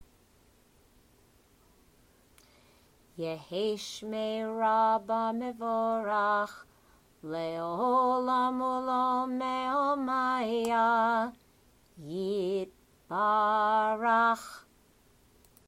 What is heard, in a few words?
Kaddish response; religious school; student prayers